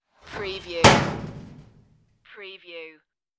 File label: close locker door; gate; the sound pack tree